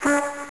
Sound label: horn